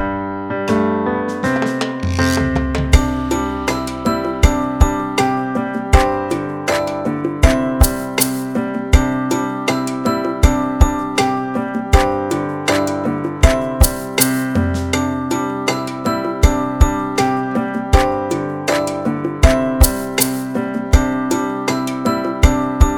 WERSJA INSTRUMENTALNA

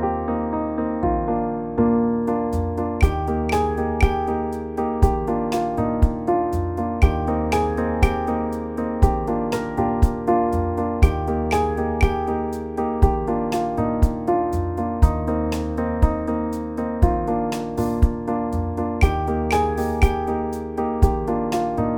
WERSJA INSTRUMENTALNA

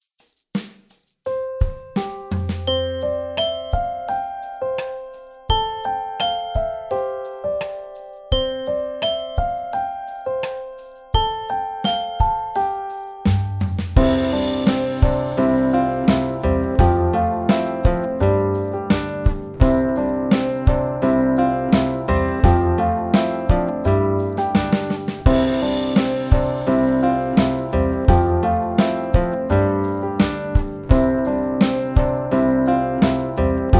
WERSJA INSTRUMENTALNA

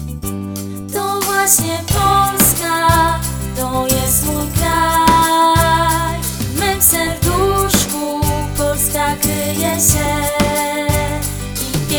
To wlasnie Polska wok

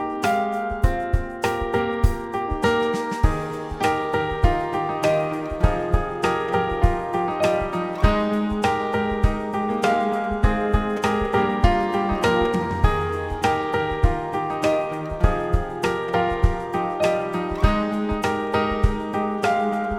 instr, PRZEDSZKOLE WERSJA INSTRUMENTALNA

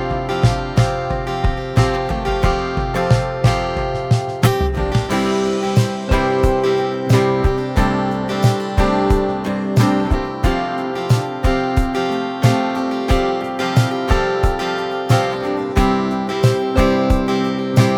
Nasze slubowanie INSTR, WERSJA INSTRUMENTALNA

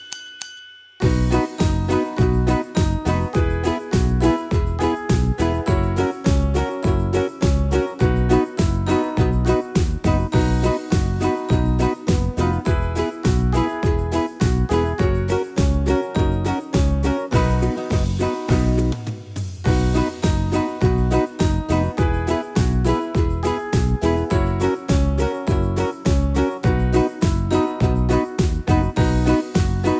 WERSJA INSTRUMENTALNA

